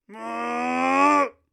ceiling drop

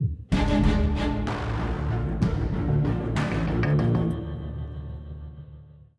190bpm; Legends of Pegasus; windows gamerip 2012

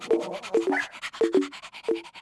Puppies

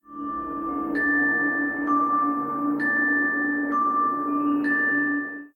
Insert Sad

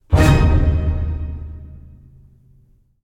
Stingers Stabs; gamerip

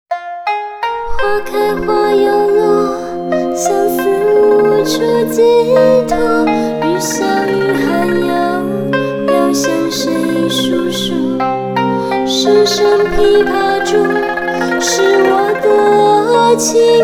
(Instrumental Version); gensosangokushi ii original sound track

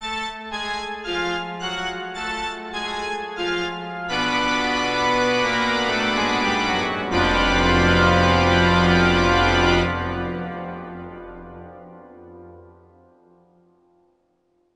Sitar

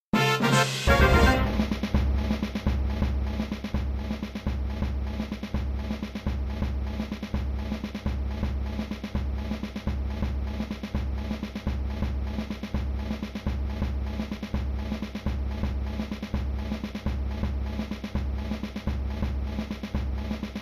End of Ball & Bonus Drums; Indiana Jones The Pinball Adventure; Williams; arcade

